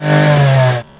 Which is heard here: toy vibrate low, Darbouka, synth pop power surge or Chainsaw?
Chainsaw